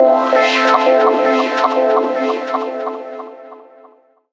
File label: PAD MOIST; Rawcutz; Nu Forms